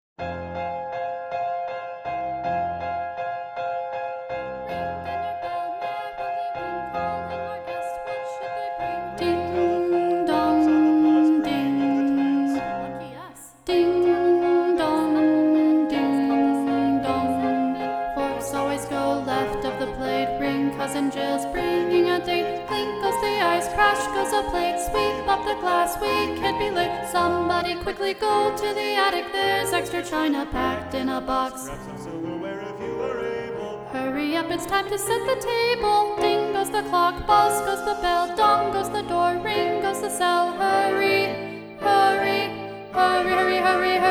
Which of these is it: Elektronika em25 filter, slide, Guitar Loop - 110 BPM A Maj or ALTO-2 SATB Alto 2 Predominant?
ALTO-2 SATB Alto 2 Predominant